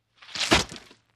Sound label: Spear & Knife Into Body, Quick Knife Stab